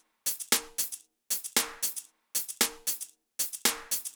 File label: Drumloop 115bpm, Nu Disco; Zero-G